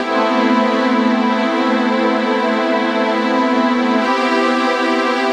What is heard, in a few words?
Sweet Strings